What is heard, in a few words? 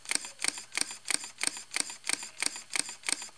Canon EOS 400D Digital Rebel XTi Burst